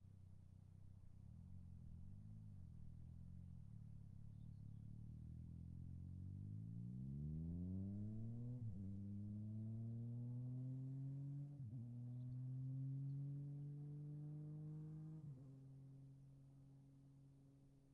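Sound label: Yamaha MT09 exterior fast by; ortf; mkh8040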